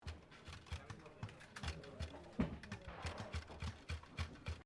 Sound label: MUSKET FLINTLOCK 50 CAL AMERICAN FRONTIER REPRO RAMMING; Black Powder Muskets; Gun Shots (Sound Effects:Guns)